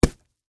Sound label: Stab Melon Hit, Fruit; Texture; Food; Sound Effects:Household; BlastWave